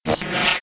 upgrade purchase; cstrike

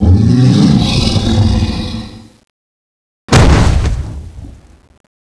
death; Frozen Terror

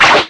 knife miss; db mod 15

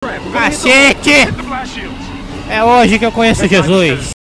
nade

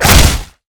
charger punch; cstrike; zombie plague

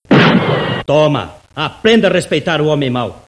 headshot

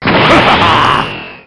power up; db mod; broly

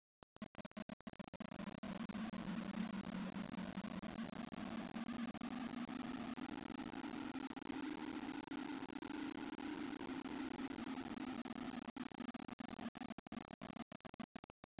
ghost; cstrike

